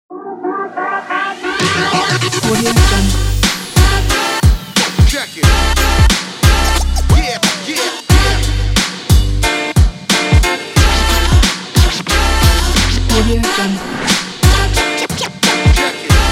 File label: hiphop this